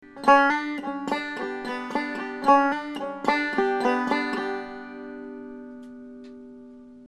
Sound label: hammer